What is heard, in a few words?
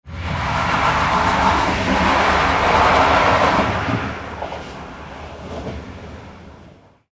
train cabin inside trains crossing